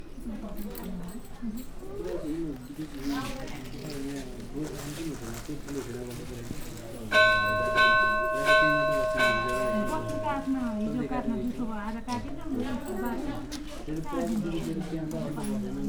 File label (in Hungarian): ket harang beszed